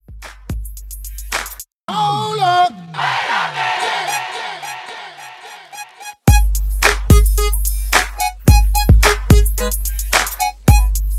Break In Outro Hook 109 BPM

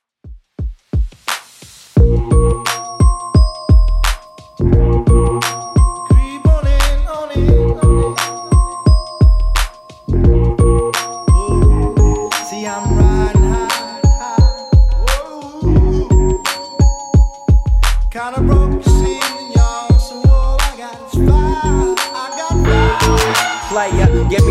Old School Redrum 87bpm

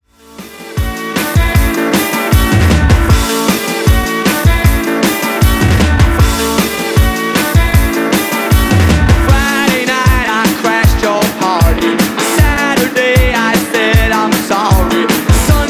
Classic Redrum 155 Bpm

